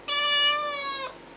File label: cat; producthuntgame